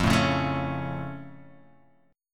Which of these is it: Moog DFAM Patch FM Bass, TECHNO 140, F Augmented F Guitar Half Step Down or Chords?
F Augmented F Guitar Half Step Down